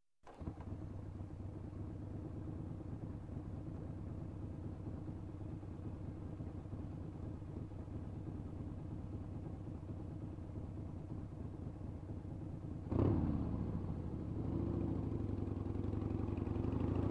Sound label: Motorcycle approaching, turning off